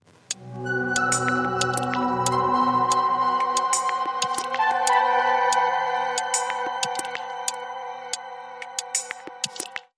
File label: Link Sting